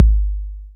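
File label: BD, bass drum; X-Static Goldmine 1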